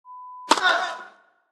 Tennis serve

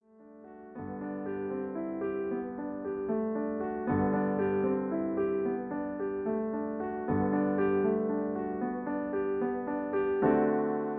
Accompaniment G

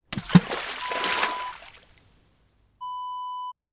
water splash rock

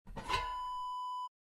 glass jar lid open

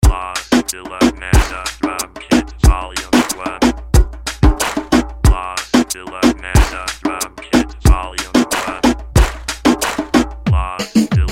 Drum Kits

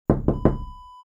window knock

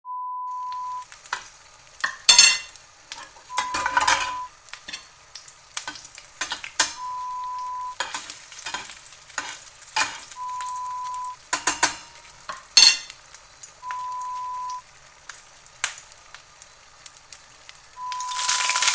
Cooking Sounds

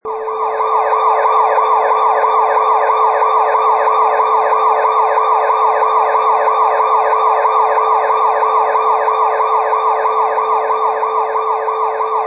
Police Siren

